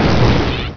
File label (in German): blitz ende; hurrican